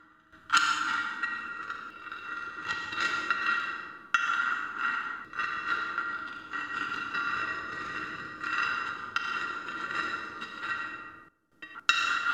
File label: Scrapping Noise